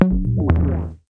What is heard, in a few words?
death; SFX death ideas2